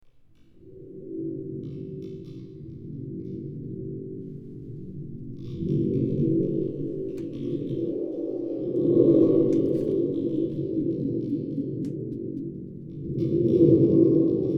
soundbeispiel donnerklang

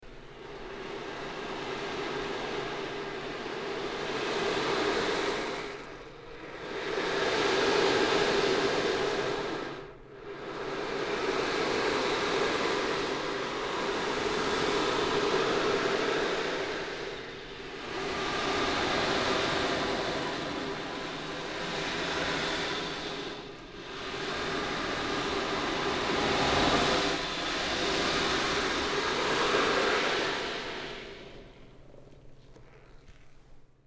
oceandrum 40 cm

